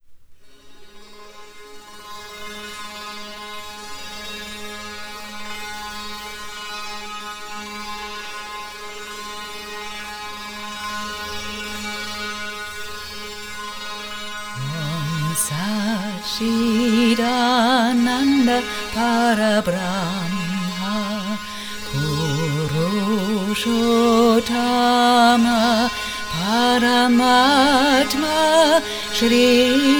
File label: Moola Mantra